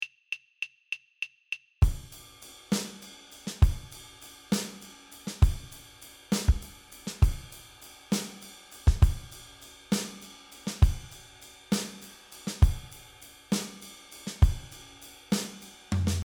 Backing track 6/8 time 80 bpm, strumming patterns for guitar; 6/8 time signature (80 bpm)